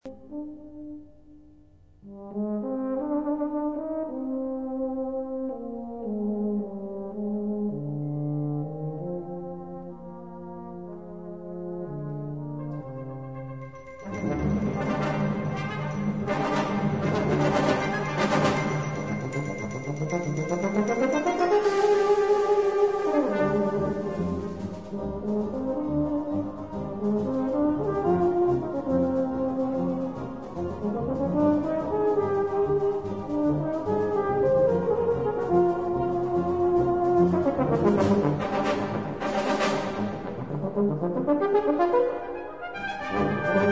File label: euphonium